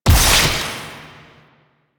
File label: Damage Zone Place; Warframe; Nineteeen Ninety Nine; PvPvE